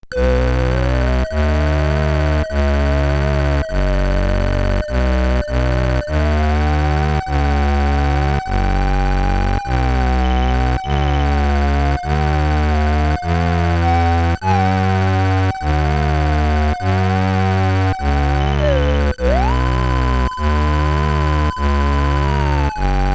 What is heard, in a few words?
BGM, SID Stereo, Commodore 64; Fist II The Legend Continues